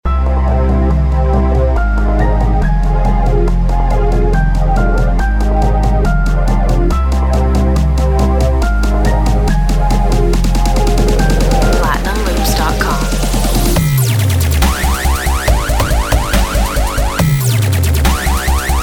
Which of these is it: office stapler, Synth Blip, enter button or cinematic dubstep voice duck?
cinematic dubstep voice duck